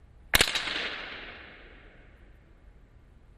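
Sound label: Hockey Medium Impact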